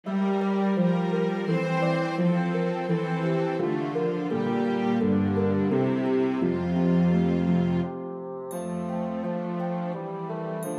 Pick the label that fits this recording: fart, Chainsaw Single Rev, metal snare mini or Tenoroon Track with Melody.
Tenoroon Track with Melody